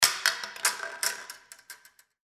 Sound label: fencing sabre